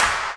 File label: Clap; osu